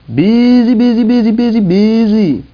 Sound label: bee One, Garden; Wayzata Technology